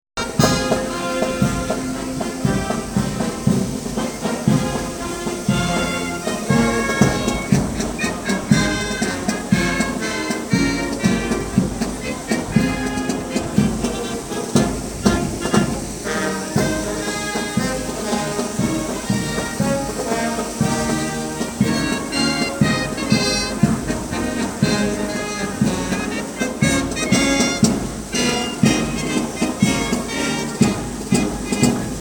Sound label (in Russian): Военный Оркестр